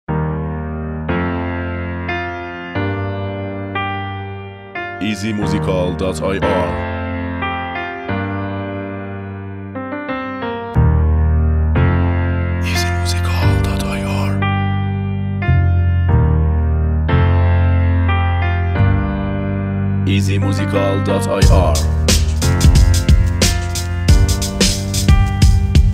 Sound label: Beat